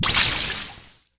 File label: space club, Zaps and Beeps